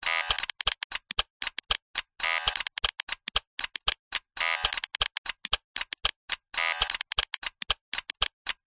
science fiction steampunk mechanism metal small clicks mechanical operate; zapsplat